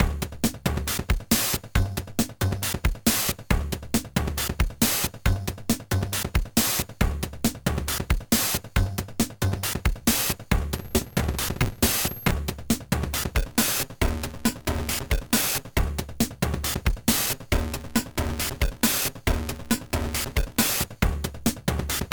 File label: Beat using individual pitched and bent sounds 3, Tr 505 bend